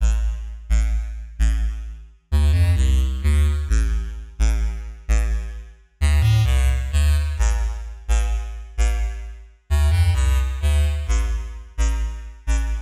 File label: Phase Ring